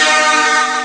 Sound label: big e guitar; Amiga Soundtracker Sample Packs